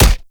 Alt Punch; weapons; Warframe; Fulmin Prime

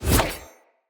Sword Whoosh Heavy; Fey Sword

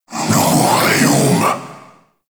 Death Screams; Warframe; Dante

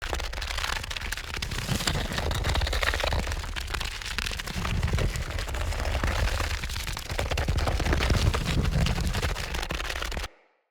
Stone Move Large Loop; Puzzles

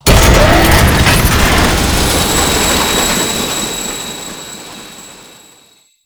Elevator Enemy Start; Warframe; Jade Shadows; Ascension

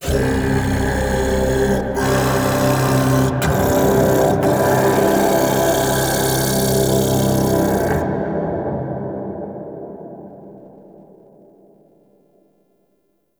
Misc Shouts; Warframe; Man In The Wall; Entrati

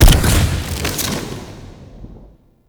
Alt Shot Punch; weapons; Noctua

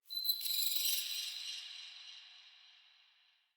Bat Chatter Distant; Ambience; Under City

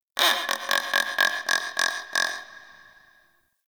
Forest Creature Mid Far; Warframe; Grineer

